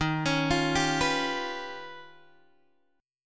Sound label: D sharp Augmented 9th D sharp Guitar Open G